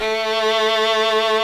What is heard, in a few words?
string; Zoom - Release 2 (1996); Active Software; strings